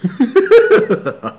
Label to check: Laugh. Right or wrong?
Right